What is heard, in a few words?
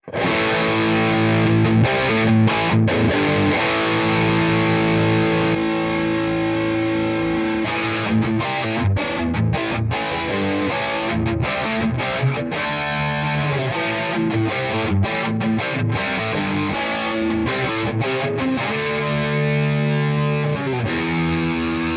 Doubler